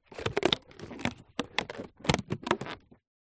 foley balloon popped skin rubber movement handling